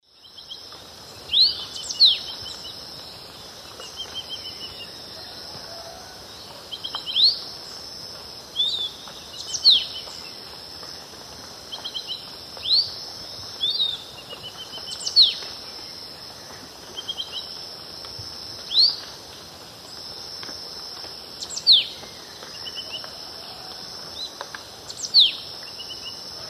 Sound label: Euphonia chrysopasta, song; S. America; Eastern Lowlands